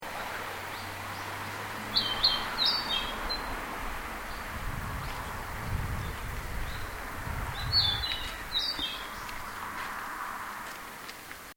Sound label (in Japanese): kibitaki